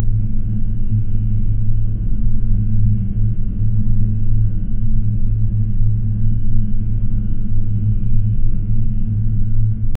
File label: sci-fi spaceship inside